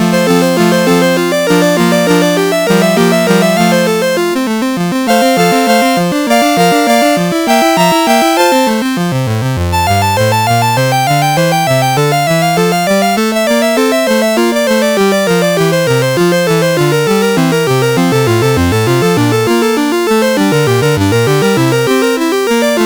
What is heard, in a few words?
Prelude in F, CSG8580R5; Beatriz Woods